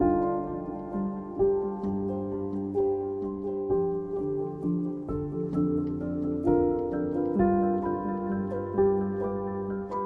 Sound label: A Piano; 1000xRESIST Soundtrack